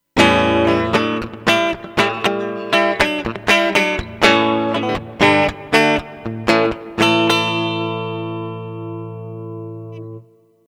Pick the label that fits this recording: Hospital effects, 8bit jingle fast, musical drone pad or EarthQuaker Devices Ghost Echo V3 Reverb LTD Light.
EarthQuaker Devices Ghost Echo V3 Reverb LTD Light